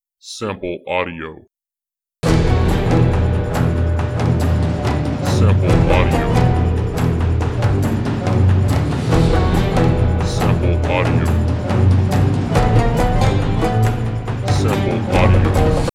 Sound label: Arabian loop